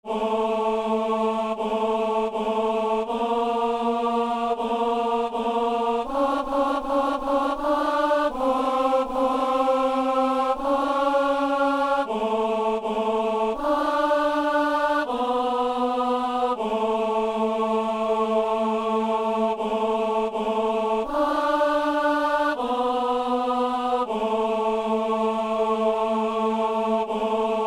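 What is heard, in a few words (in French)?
ténor; messe inaugurale; agnus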